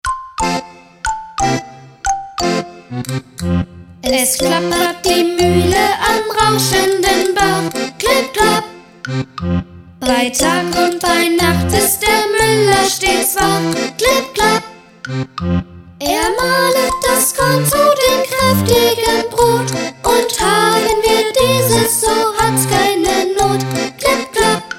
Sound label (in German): Es klappert die Muehle am rauschenden Bach